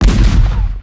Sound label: Cannon Shoot Sound; War Machine